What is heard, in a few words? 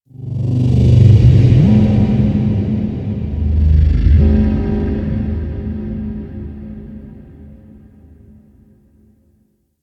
Glass Texture Large, Ambience; Warframe; Cephalon Weave; DE